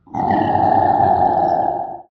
ogre angry, utterance

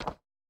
stone step, footsteps

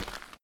step dirt, footsteps

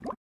running water, river sounds

